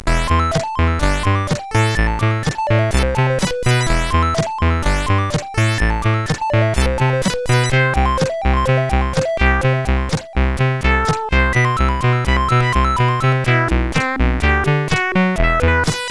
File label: Clik Clak, CSG8580R5; hvsc